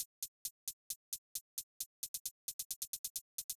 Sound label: hats, drum loops